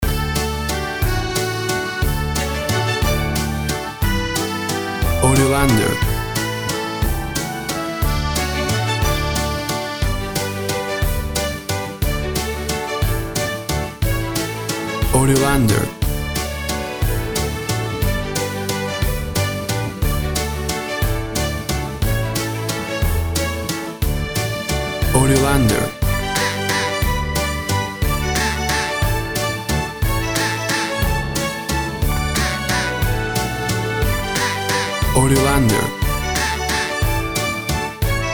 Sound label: Waltz for Kids